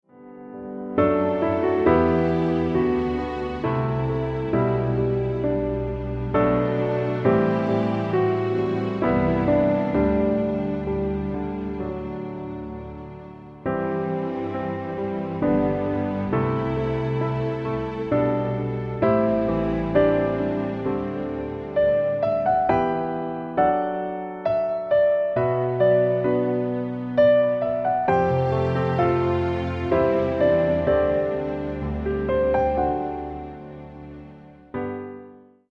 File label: Come Thou Fount